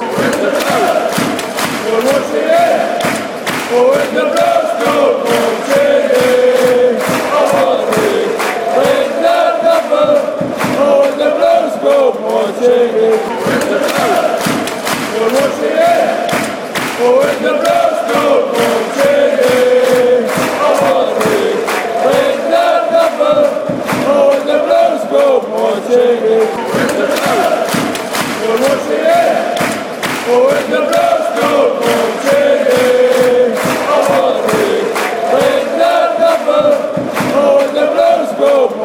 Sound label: when the blues, chants